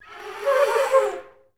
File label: FL FX, Advanced Orchestra